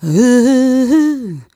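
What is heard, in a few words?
"uh" female, 127, e-flat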